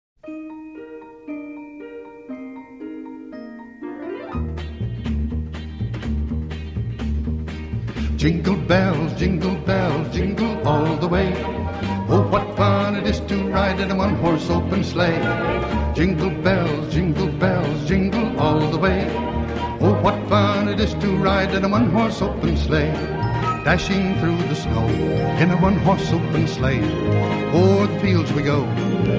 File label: Christmas Music Jingle Bells